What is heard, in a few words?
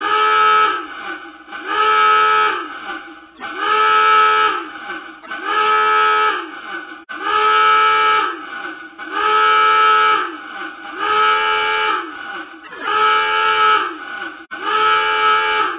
U Boat Siren